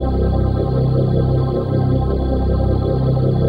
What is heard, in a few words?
PHASE PAD, ATMO PADS; Best Service; XX Large